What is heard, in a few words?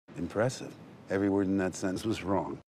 Wrong